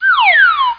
chart down; Forefly2; attic

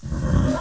horse crit stop

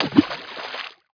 sound ploop; MOTS

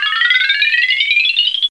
Anim Up

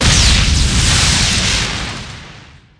Expl smal

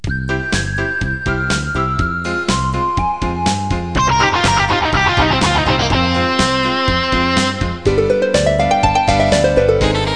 scale; animwave

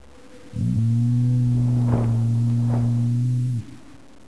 Lone Diplodocus; Walking With Dinosaurs; BBC

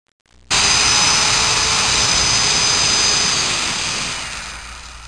Electric Drill

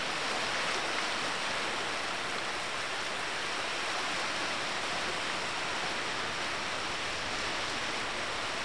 OCEAN

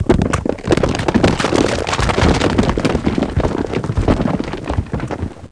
rocks; duke nukem total meltdown

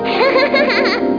laugh

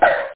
skid; ShareWare OnLine Volume 2 (CMS Software)(1993); monbash

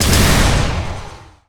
new laser; Earth and Beyond; EA Games

